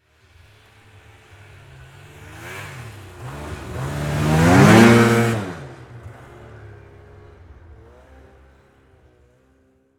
Snowmobile By, Snowmobile, Pass, By, Close, Fast, Speed, Yamaha, Exciter, 440; Sports; BlastWave